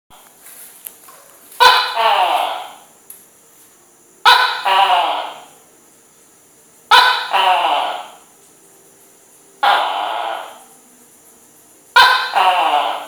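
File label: lizard voice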